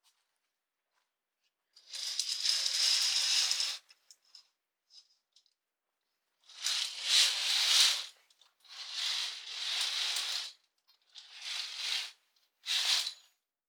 カーテンを開ける; 動作系

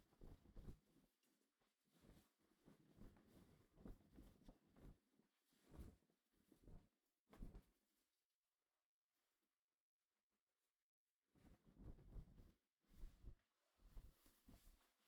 シャツ を 着る; KU100; 色々な動作

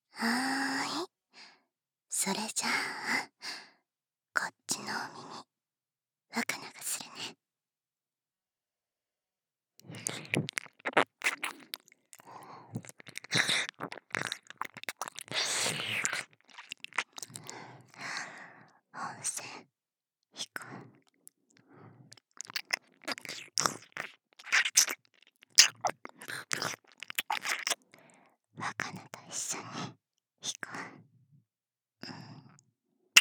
温泉 耳舐め, ASMR; 温泉予告耳舐めわかな; 予告分のみ非スタジオ バイノーラル

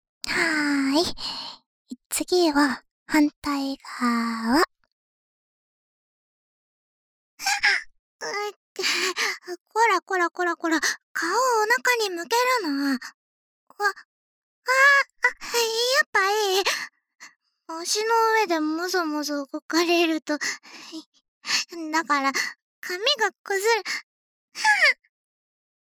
次は左耳の耳かきしたげる, ASMR

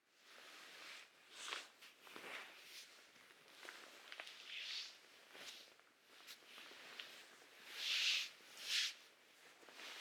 布団もぞもぞ右へ移動; 動作系

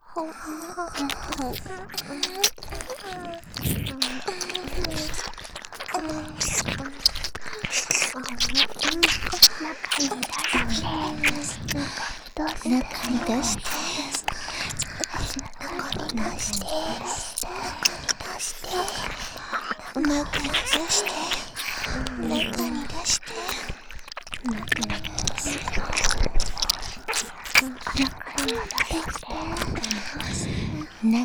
6人 中に出して 耳舐め; ハーレムオナサポ 4人のメイドがご主人様のオナニーをお手伝いするバイノーラル～文、千曜、名月、莉桜～

